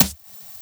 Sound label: Snare, Dubstep One-Shot Drums